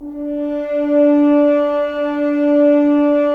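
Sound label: Solo French Horn; Roland - LA Composer vol. 4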